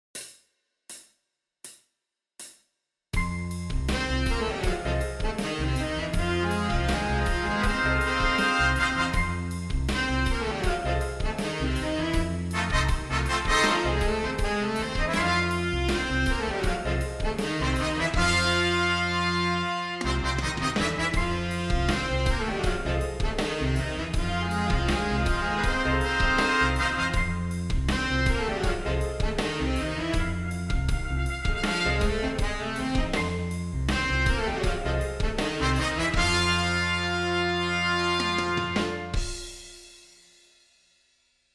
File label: First Lip Slur Horns in Fifths